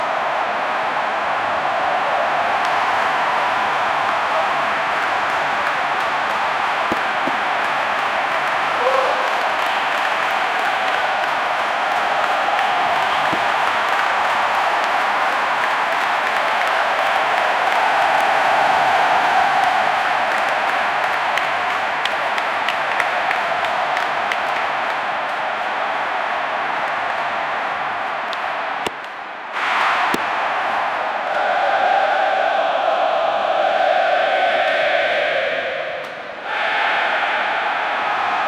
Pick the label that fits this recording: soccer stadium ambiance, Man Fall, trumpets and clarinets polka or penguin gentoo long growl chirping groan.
soccer stadium ambiance